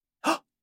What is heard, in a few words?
man gasp